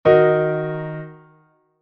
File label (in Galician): cadencia interrogacion